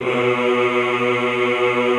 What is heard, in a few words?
EHH B1, Classical Choir; Best Service